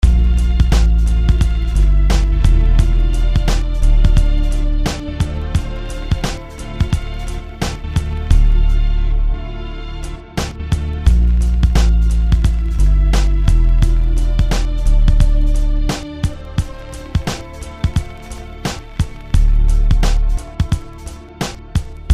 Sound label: weaker snare flying